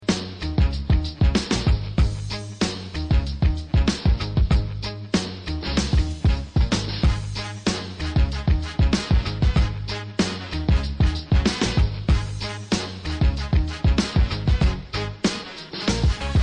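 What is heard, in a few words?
dragon tiger background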